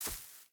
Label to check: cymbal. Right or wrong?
Wrong